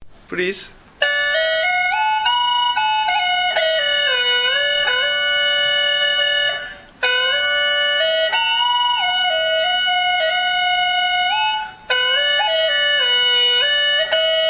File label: hulusi